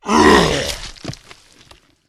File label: zombie attack